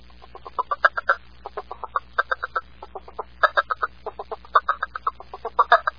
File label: leopard frog